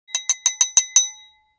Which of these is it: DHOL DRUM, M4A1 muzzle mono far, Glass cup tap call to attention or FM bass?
Glass cup tap call to attention